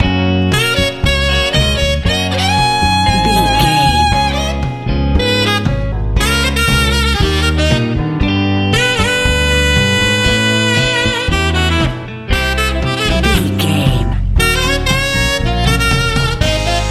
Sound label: funk